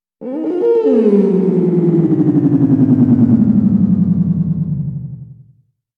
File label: Space Echo, horror sounds; Hollywood Edge